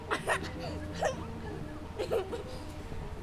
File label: Child Laugh